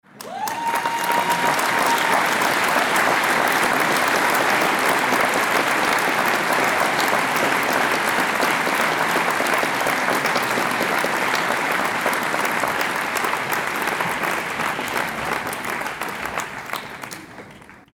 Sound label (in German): Applause Johlen